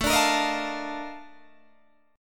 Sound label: Bb Major 11th A